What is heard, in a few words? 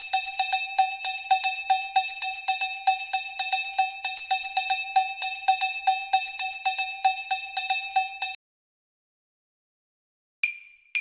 Latin Cowbell Shaker and Wood Block 115 BPM